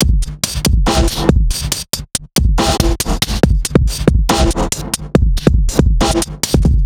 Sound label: drum